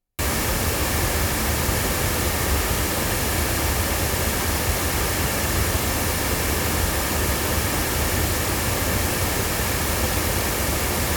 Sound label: Blue Bluebird loudspeakers